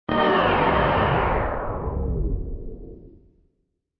Orch Synth Stp, Synthy stopping sound.; Orchestral Synth Stop; Stops; Sound Design

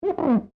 GLASS SQUEAK FINGER

